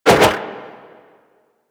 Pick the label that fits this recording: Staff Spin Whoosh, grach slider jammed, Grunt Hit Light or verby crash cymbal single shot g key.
Staff Spin Whoosh